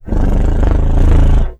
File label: Purr, Trombone Extended Technique